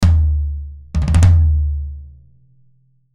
Musical Percussion, Musical Percussion Hit To Roll Tom Deep; Hits; Blastwave; Sound Effects:Musical